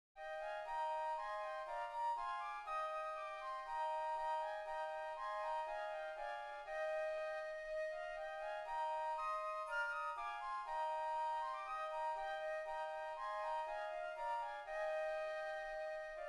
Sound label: Hymn Flute; Hymn 632